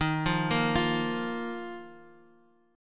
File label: Eb Suspended 2nd down; Ebsus2 arpeggiated; JGuitar